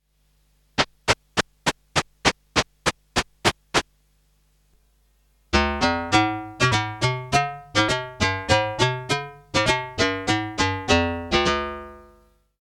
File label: karplus, synth; jurgen Haible; sonofstormtide